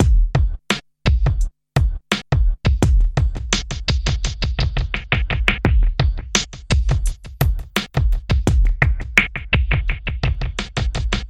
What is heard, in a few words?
drums delayed filtered